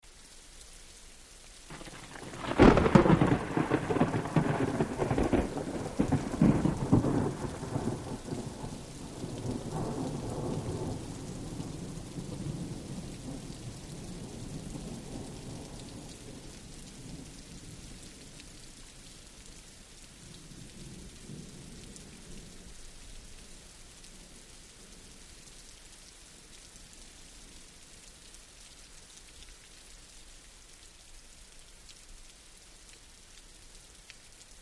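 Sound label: Close Thunder Clap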